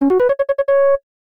Player 1 Goal In; Marble Madness; arcade; Atari System 1

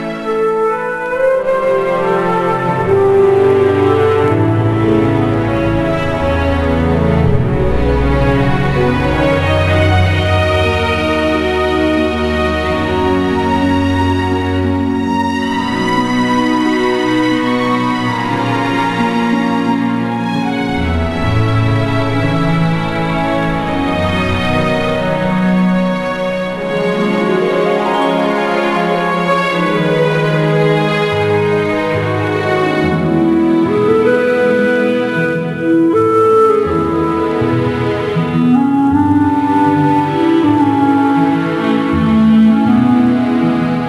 Music Low; game rip; Legend of the Guardians The Owls of Ga'Hoole; nds

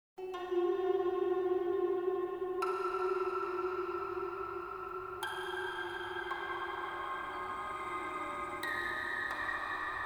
Astral World ambience; Lode Runner On-Line The Mad Monks' Revenge

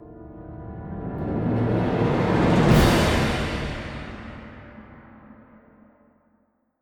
Vampires Bossfight Outro; Toussaint

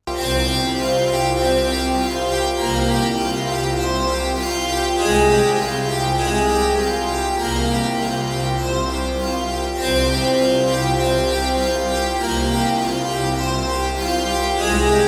variations (cembalo)

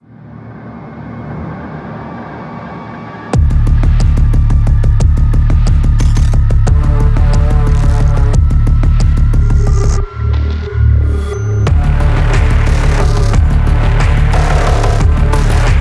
Main Ambient; Blood Harvester

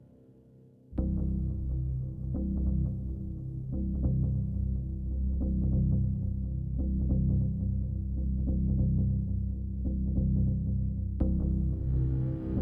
Default Loading Music; Battlefield 1

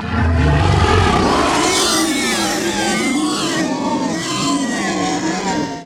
Raptor Scream; 1916 Der Unbekannte Krieg

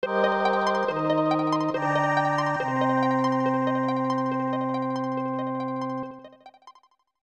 Unknown Jingle; Cu-On-Pa; SNES

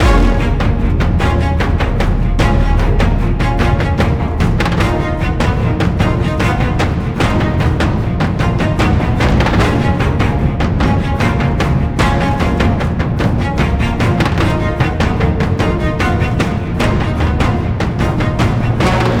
Combat High Loop

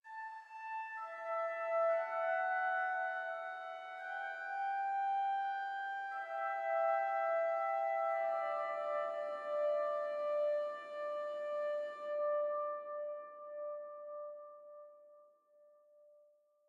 Organ High

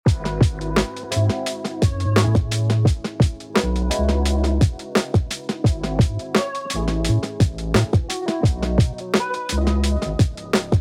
Living Room - Movie scene, Hip-Hop; IKEA Smart Home Planner

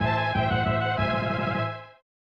Normal Game Lose; Godzilla Kaijuu Daikessen; snes

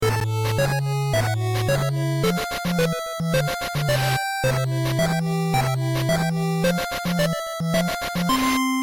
Ending - Cabin; dragon fantasy book i the volumes of westeria

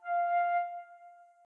Flute F1; Phoenotopia Awakening

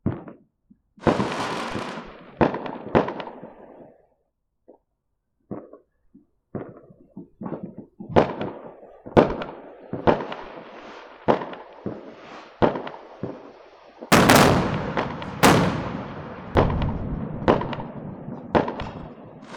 Fireworks; Dream Daddy A Dad Dating Simulator

